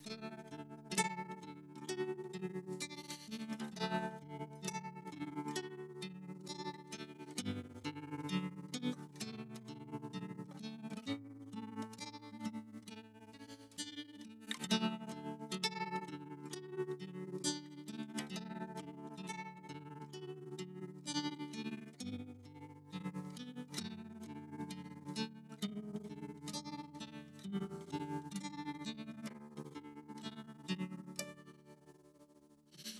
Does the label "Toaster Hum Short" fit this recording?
No